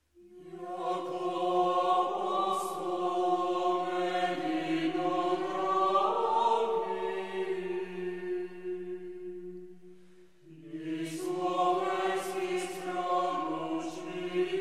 Bulgarian Orthodox Chant, Tropar na Svv Kiril i Metodiy